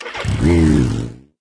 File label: Bike Leaving Faster; Farm Mania